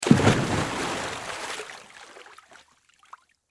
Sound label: Splash Dive Pool, Water Splash Dive Pool; Backgrounds; Pool Sound Effects; Blastwave